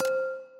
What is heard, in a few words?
Reel Stop; genesis; clash Of Queens